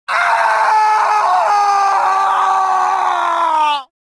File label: falling, prisoner